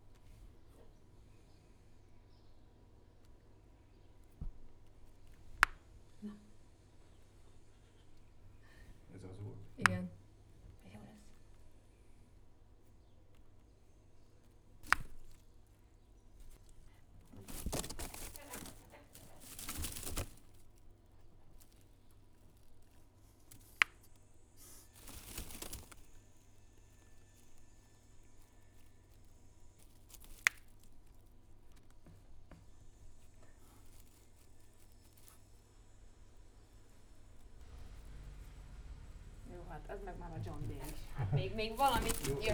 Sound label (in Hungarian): fióka eledelt kérő hang, kuvik; jaszberenyi zoo 2015